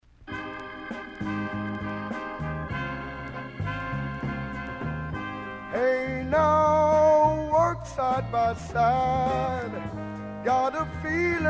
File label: Vocal; Coca-Cola; People Power